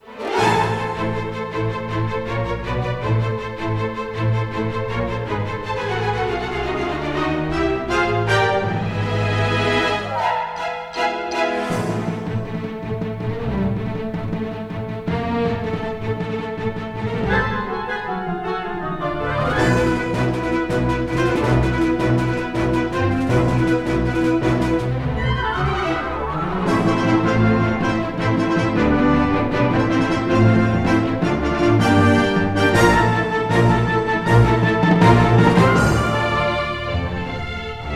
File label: Orchestral Action; orchestral battle or chase music